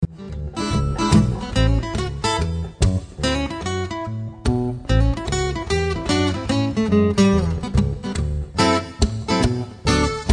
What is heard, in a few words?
swing